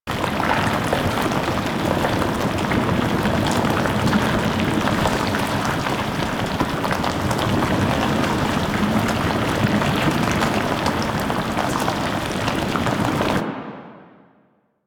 Coolant Flowing Loop; Ambience; Props; Loops; Warframe; Venus Open World; Venus Landscape

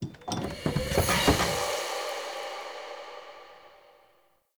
Chest Open Uncommon; Objects; Chests

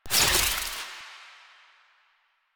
Jade Scythe Spin; Weapons; Stalker; Warframe

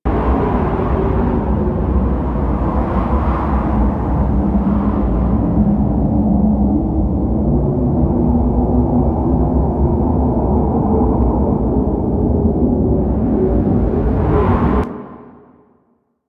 Cave Small Tunnel; Warframe; Ambience; Corpus

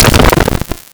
Snow Globe Burst; DeepArchimedia

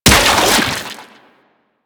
Rifle Charged Fire; Warframe; Infested; Quanta

